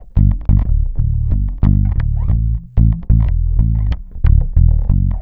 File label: Dub Bass, Electric Bass